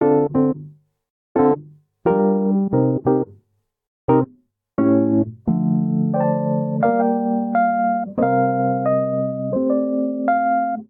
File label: Progression Hip Hop Soul i - iv - vii - V7 D Minor 88 bpm, Electric Keyboards; Ableton; Factory Packs